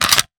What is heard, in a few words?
weapon foley pickup; Real Guns Sound Pack 2; Mono